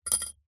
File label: industrial precision screwdriver set down concrete